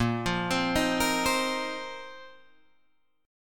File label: Bb Suspended 2nd Suspended 4th Bb down Guitar Drop D